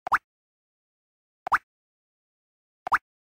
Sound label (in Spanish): Sonido para celular Gota de Agua